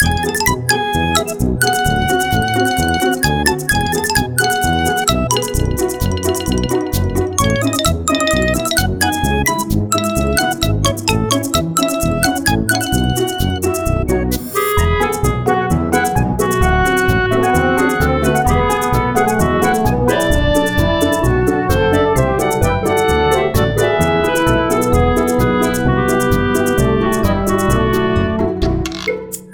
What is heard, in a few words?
menu; hungry flower